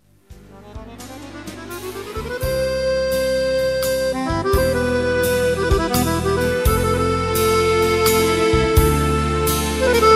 Accordionist; Houston; This Houston Accordionist was born in Italy

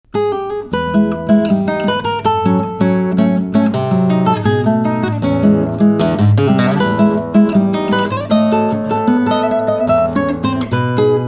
Guitarist sonatina populaire

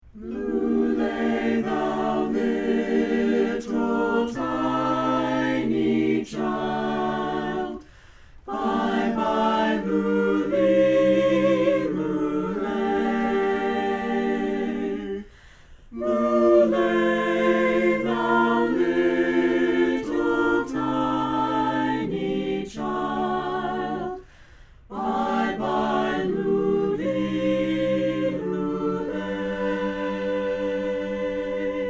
Carolers; Dallas

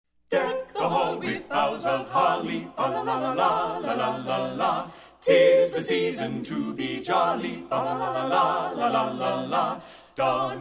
Carolers; St Louis; Deck the Hall

